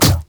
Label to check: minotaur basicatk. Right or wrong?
Right